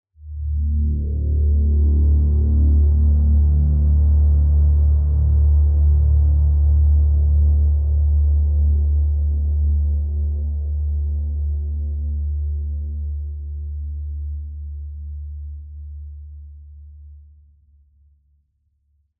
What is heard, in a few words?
Juno6 Low Chord D; Sundowner